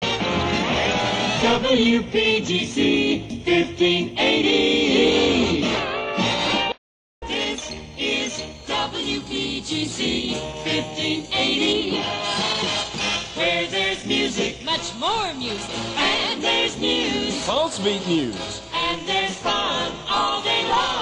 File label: jingles montage